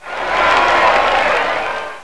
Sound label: oooh